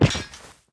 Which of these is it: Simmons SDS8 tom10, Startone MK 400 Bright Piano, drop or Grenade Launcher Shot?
drop